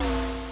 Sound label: door; Midway Games; Smash TV